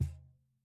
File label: Cajon hit pp; Idiophones; Struck Idiophones